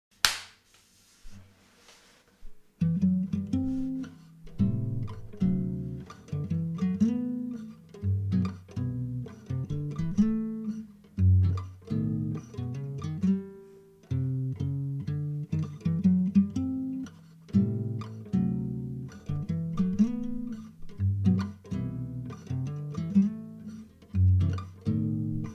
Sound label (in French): basse; LUTHERIE; Ma premiere basse; modele v2